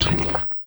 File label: Melt; A Robot Named Fight